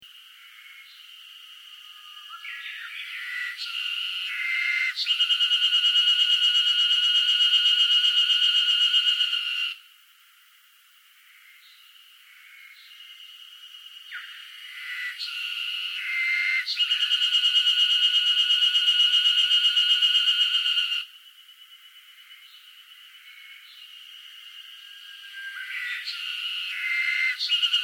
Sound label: Insect Cicada Northern Japan Summer Loop; Japanese insects; Cicadas (Sound Effects:Animals:Insects)